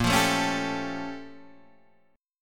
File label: A sharp Minor Major 13th, down, Guitar, Open D